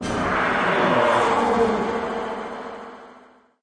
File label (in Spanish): maldicion, hechizos